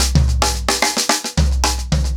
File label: 110BPM, SNARE TOM SOLOS